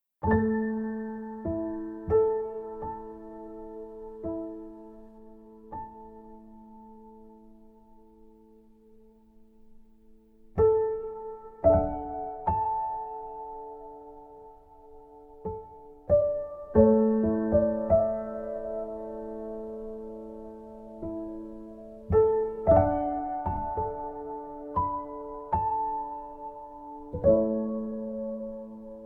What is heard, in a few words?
pno